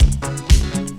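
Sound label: DISCO LOOP, Techno Trance; E-MU Formula 4000 Series Vol. 2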